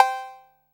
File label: 808 COW, TR-808